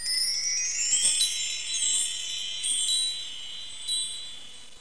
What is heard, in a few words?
plnt grow; Greatest Stories; fairy